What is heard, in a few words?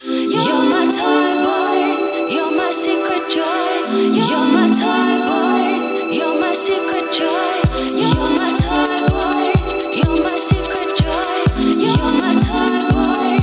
disco music 432 HZ